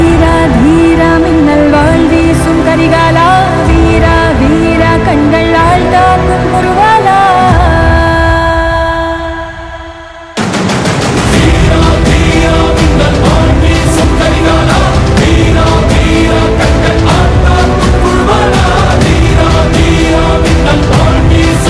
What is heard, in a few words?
tamil ringtone; Kgf tamil ringtone; India